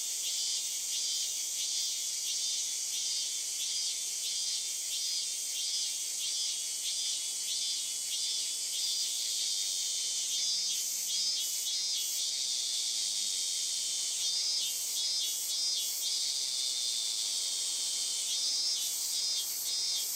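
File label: ambience cicada; midnight guns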